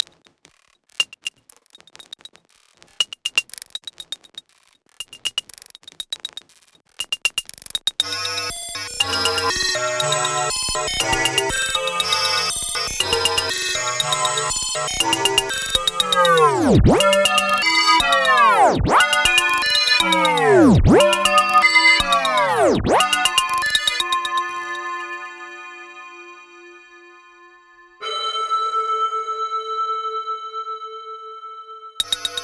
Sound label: Triangle